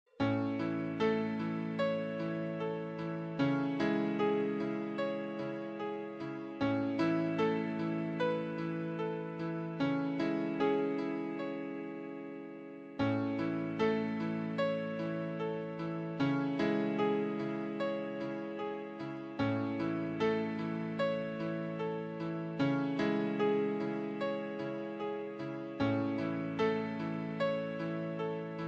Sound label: piano